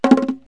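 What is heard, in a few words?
short drum, cartoon; Wired for Sound Pro CD; Aristosoft, Inc.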